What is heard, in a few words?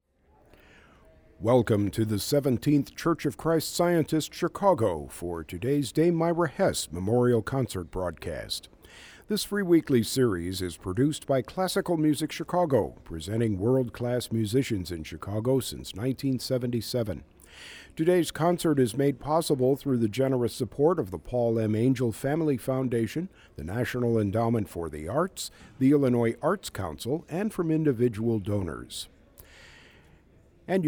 Piano Trio; wfmt; Dame Myra Hess